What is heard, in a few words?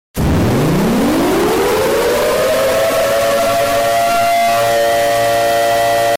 nuclear earrape